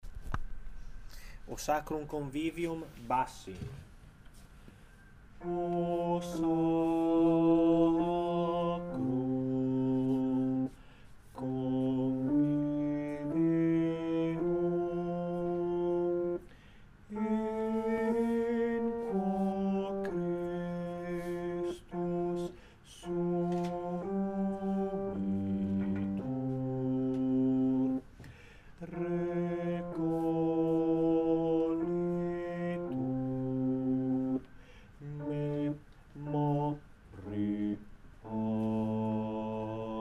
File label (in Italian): BASSI; O SACRUM CONVIVIUM